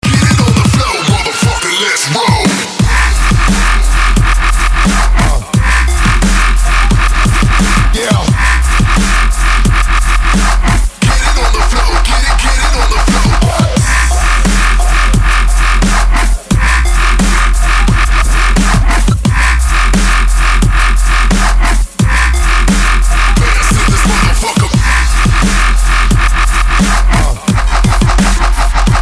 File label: dubstep